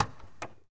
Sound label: Ferrari 355 Door Open, Automobiles